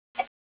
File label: Relay